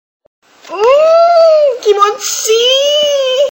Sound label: hmmmm kimochiii